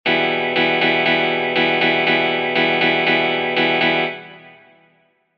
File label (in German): schalgmuster pattern; Schlagmuster für Gitarre